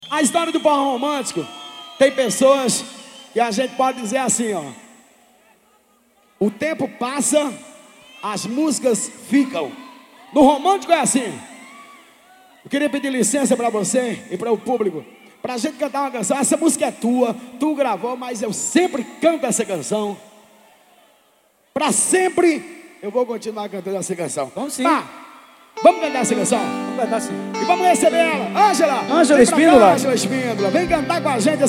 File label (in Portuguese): forro ao vivo; pari; jaelson gravações